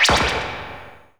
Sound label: sfx sci fi; Masterbits - Switch FX